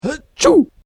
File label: Comedy Sneeze Man Sneezing; Sneezing Sound Effects; human sound effect